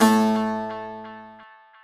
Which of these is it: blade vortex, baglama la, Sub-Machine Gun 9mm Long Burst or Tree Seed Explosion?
baglama la